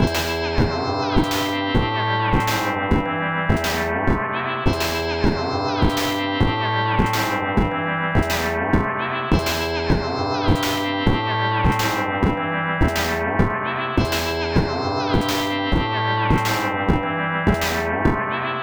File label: menu